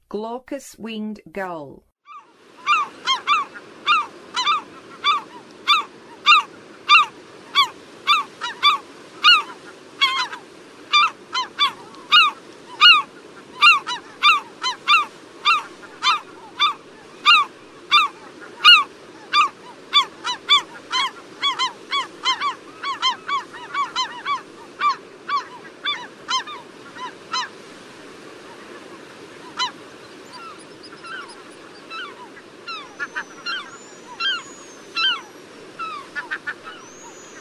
Glaucous-Winged Gull, Songs of Birds of North America; Western USA; Western USA Songbirds